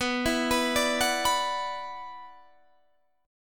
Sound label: Gb 7th Suspended 4th Sharp 5th B